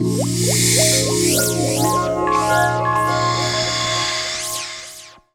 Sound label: Rhodes, 3A; Jungle; Riffs, Arps & Hits; Blu Mar Ten - Jungle Jungle - 1989 to 1999 Samplepack